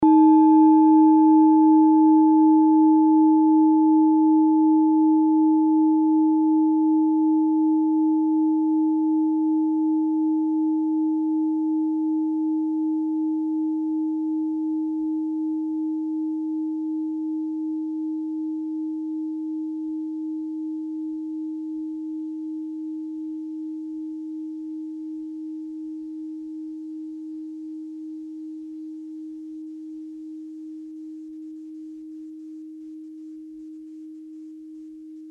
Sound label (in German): klangschale ladakh